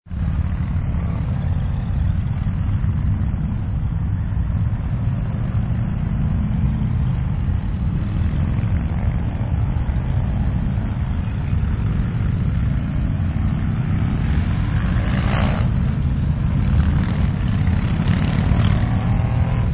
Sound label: Biker Gang Distant, Hundreds of bikers in bike gang drive by.; Motorcycles; Vehicles